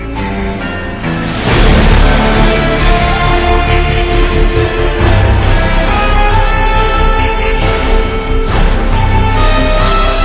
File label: tune; chaos